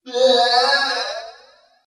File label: Disgusted